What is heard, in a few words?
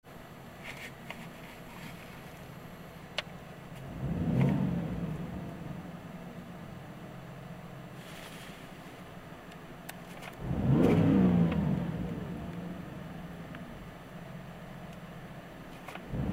3000rpm inside; 30RspecB